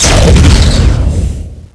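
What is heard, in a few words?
zombie grenade explosion; tayo escape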